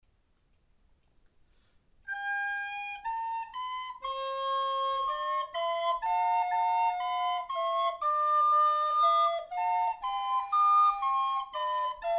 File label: fifth canon